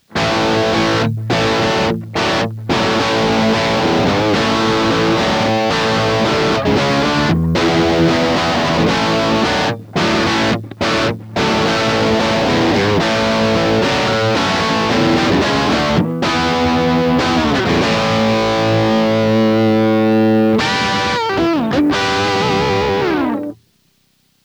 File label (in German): Orange Gitarren Effektpedale Distortion Mid Depth